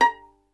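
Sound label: STR violin; Miroslav Vitous; Solo Instruments VOL-2; Solo lnst. II; Vln Misc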